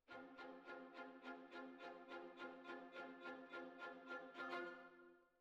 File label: Violins Over